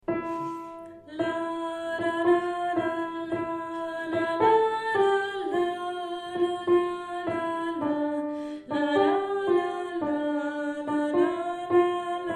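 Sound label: alto; milonga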